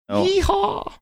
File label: yeehaw